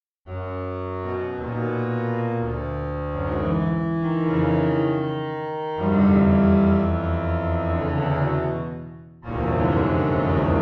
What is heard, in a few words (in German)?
geister cello